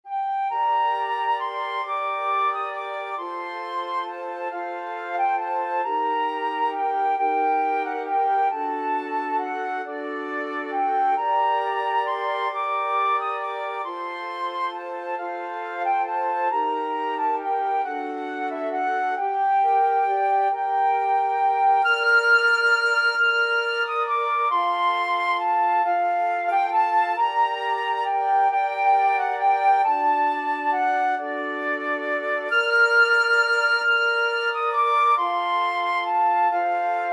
greensleeves, flute quartet

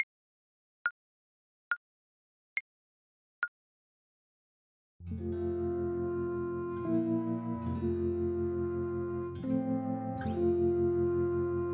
Bassoon

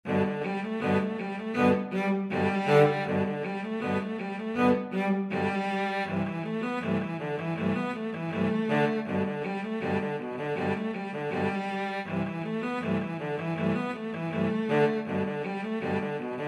cello quartet; 1812

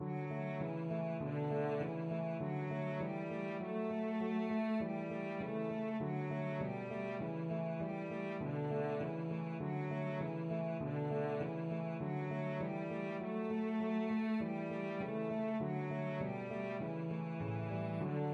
humming song, cello

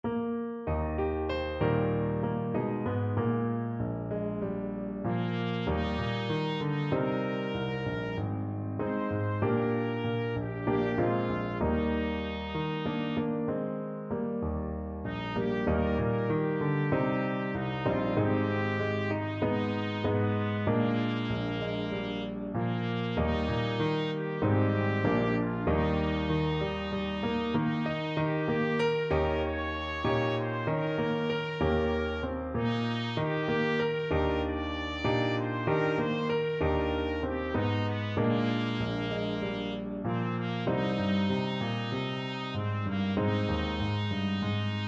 hes gone away trumpet

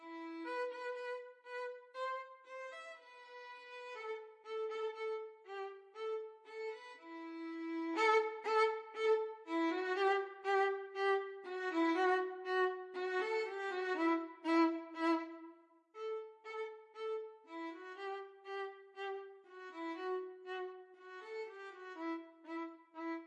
violin

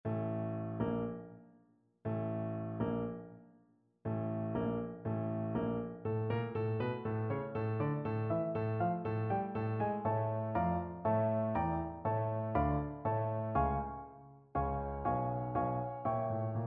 dvorak new, bassoon

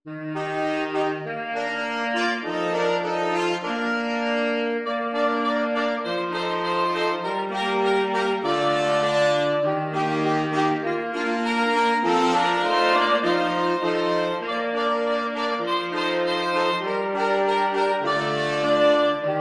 saxophone quartet; old st nic

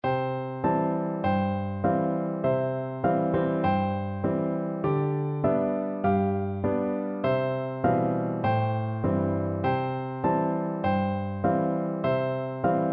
london bridge, piano duet

